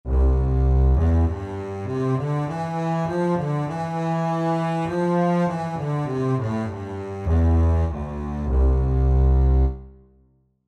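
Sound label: octave and 4th double bass; Range identification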